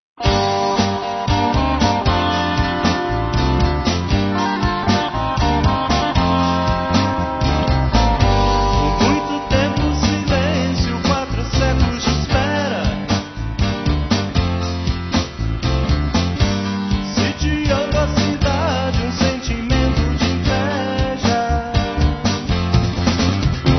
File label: blues